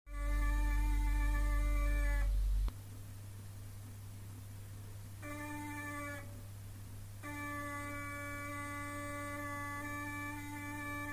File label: intermittent sound in declination drive